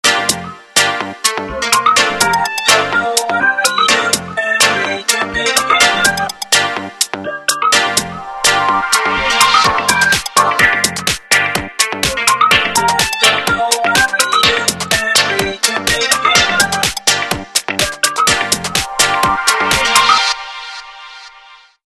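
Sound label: cherry pop, ringtone; A3100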